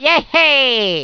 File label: long jump; luigi; supermariobros